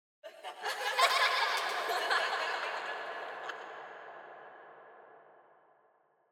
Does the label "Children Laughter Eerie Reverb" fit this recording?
Yes